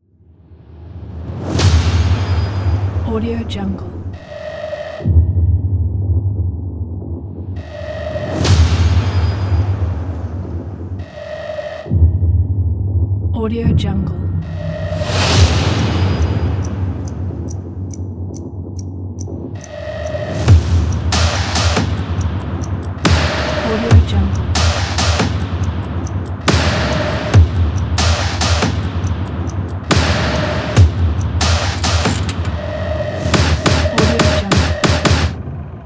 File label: evil clocks trailer intro opener